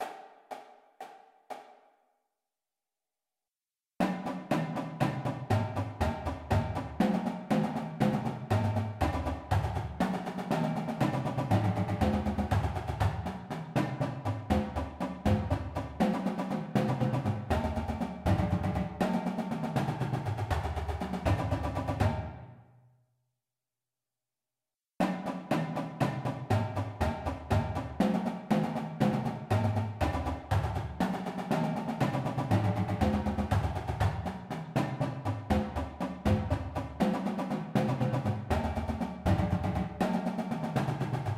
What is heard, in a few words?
Paradiddles; Intermediate Paradiddles; Drumline Warmups